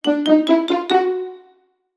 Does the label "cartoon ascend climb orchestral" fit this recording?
Yes